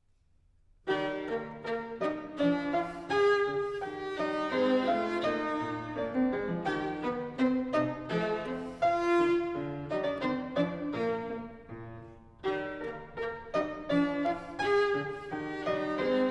Sonata for violin & piano in E flat major, Allegretto; Mozart